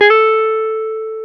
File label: Jazz Guitar